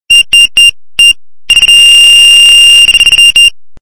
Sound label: Fox screamer